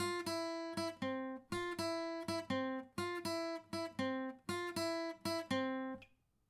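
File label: acoustic pattern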